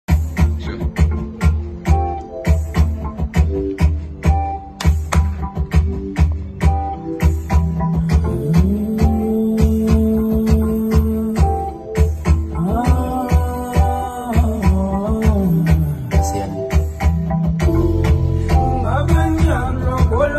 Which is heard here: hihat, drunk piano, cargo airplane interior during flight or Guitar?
Guitar